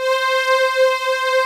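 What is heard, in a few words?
ANALOG PADS; Pro-Rec - Synthsonic; TRINITY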